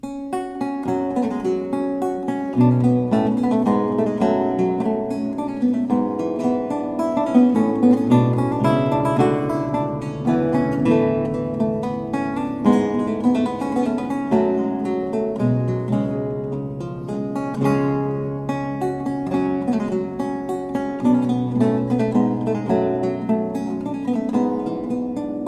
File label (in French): Suite en Sol Mineur Courante, Lute Baroque; EG Baron